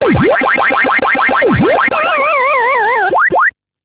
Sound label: gaming; Alcatel OT-756